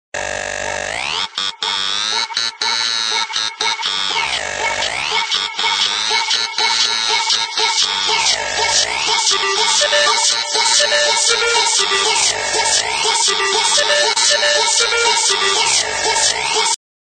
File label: Mixed Beats Ringtone